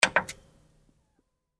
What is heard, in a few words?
Television Off Button Click; Technology; Televisions; Electronics; Blastwave